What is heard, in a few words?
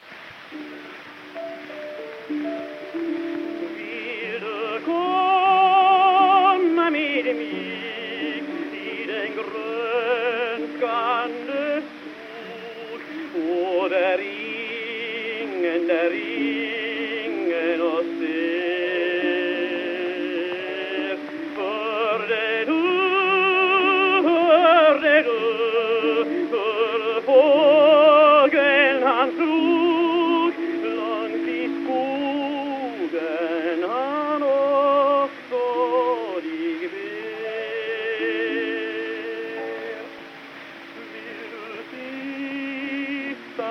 Gramophone